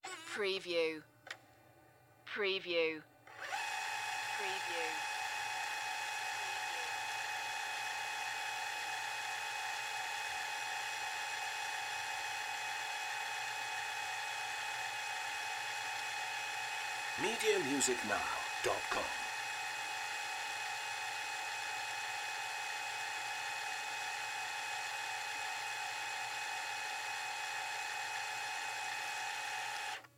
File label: DV Camera Rewind